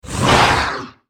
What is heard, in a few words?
attack; darkwraith